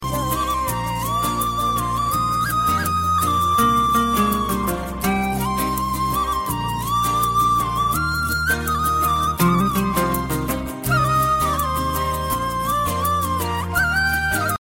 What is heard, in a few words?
sweet iphone 4s remix ringtones ringtone